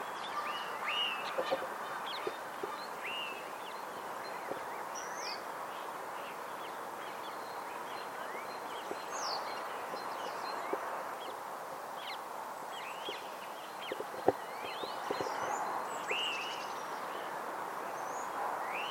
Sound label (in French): étourneaux grives litornes